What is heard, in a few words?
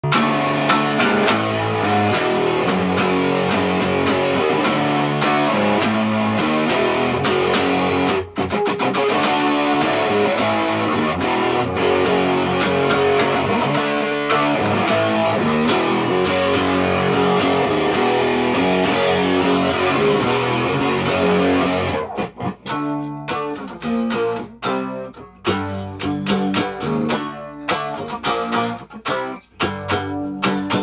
indiscriminate use of power chords